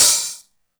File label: Hat, Yamaha DD-20